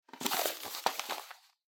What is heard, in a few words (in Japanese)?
新聞紙を広げる音